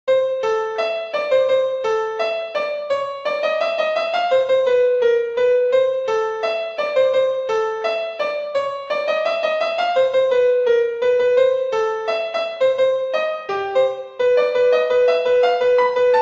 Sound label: I like da piano